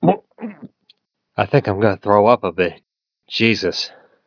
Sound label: throw up; BARNEY